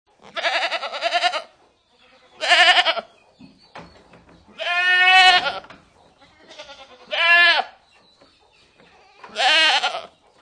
chevre

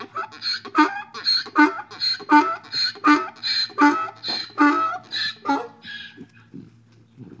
âne

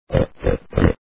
cochon

